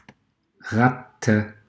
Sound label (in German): ratte